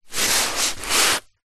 footstep single scuff scrape barefoot on foam exercise mat; zapsplat; foley